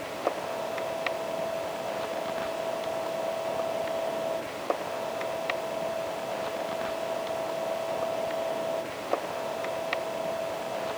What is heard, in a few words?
Microcassette Noise; FX; Cymatics; Eternity Sample Pack